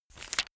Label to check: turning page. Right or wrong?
Right